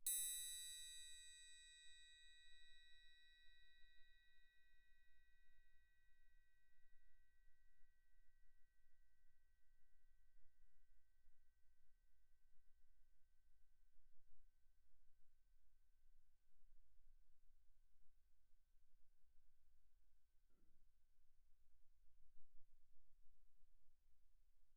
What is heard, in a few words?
6 triangle, mf; Percussion; hand percussion